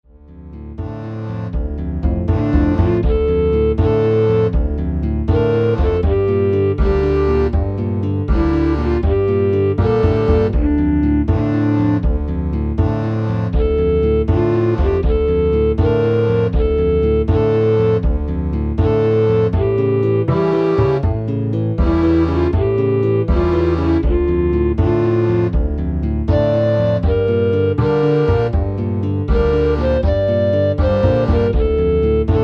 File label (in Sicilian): bedda matri a razia insieme; canti madonna